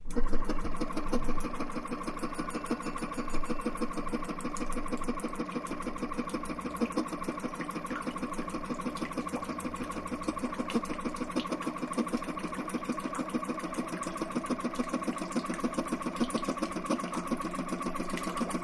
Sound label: Machine, Rhythmic; Pumping Watery Machine Increases Rhythm And Intensity, Climaxes And Subsides ( Dental Water Pic )